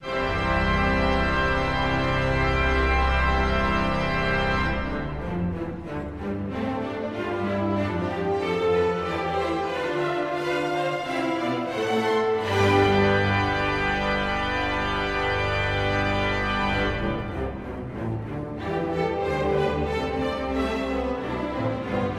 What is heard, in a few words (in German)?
Orgelsymphonie Maestoso Anfang